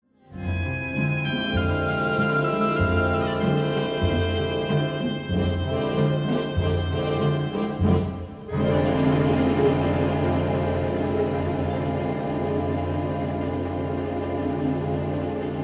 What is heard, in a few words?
trill